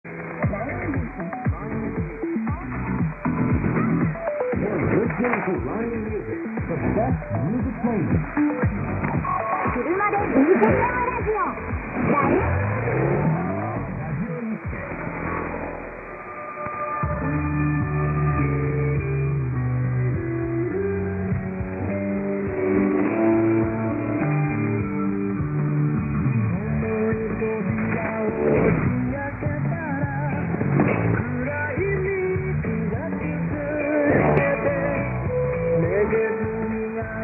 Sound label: Radio ID; 3945 Radio Nikkei 2